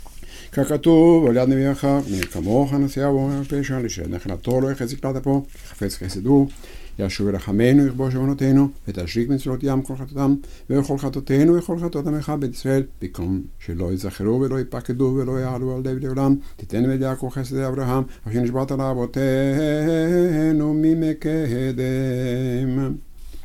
Kakatub al yad nebiekha; Kippur Arbit

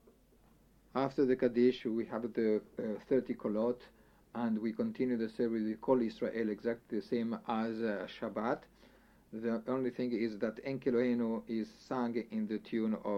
Shofar Kol Yisrael; Rosh Hashanah Musaf

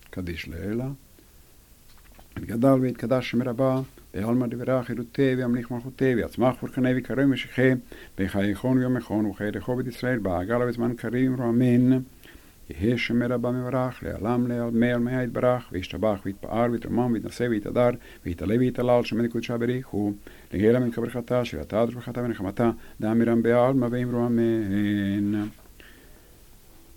Half kaddish; Pesah

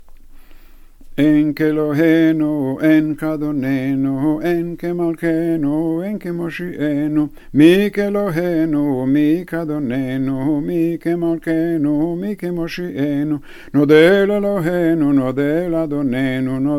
En kelohenu; RH Musaf

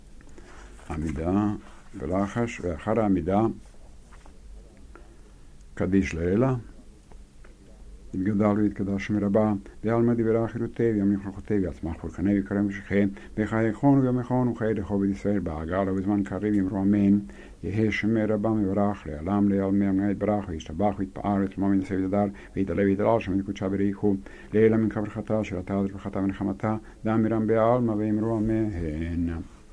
Half kaddish after amida

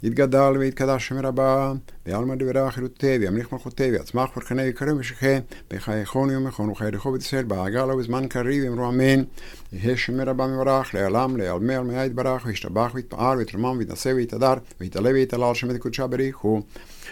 Kaddish; Kippur Neila

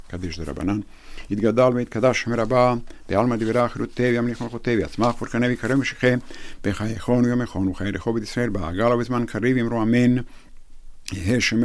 Kaddish derabanan

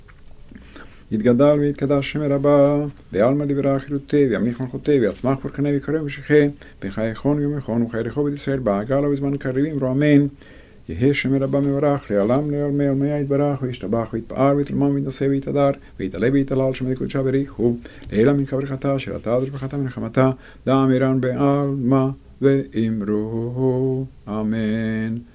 Kaddish; Shabbat Minha; Legnela

